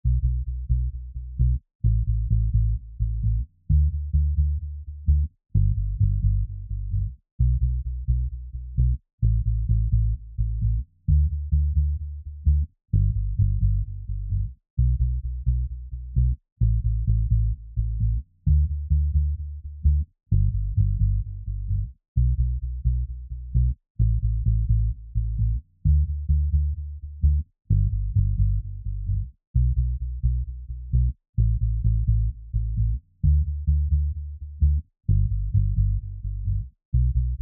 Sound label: 130 BPM D Maj Bass, Guitar; Melodies; Loop Stems; Cymatics; GHOST (Beta Pack)